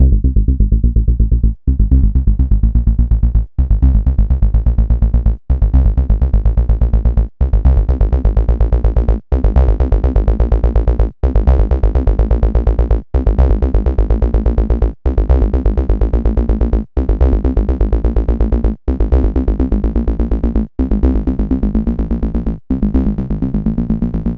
synth arp; Electrocution

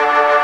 Organ, MortStrg+WurlVox

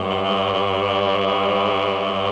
cho g1, Choir, MELLOTRON; Best Service ProSamples vol.55; Retro Sampler

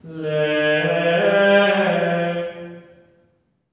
GR ALLELU, Symphony of Voices; Spectrasonics

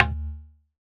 Buk Rim; Roland BK-5; Percussion

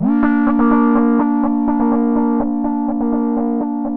Analog Rhythm; E-MU Producer Series Vol. 6 – Analogia Project (CD 1)

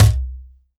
MIXED DRUMS, World Instruments; Best Service ProSamples vol.52 - World Instruments 2; AKAI

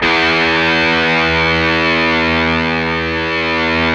GTR, Dirty; Club-50 - Foundations; Paul-Stack

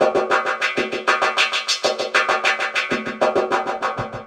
Echo Chuff Down Up, Synthetic Pulses

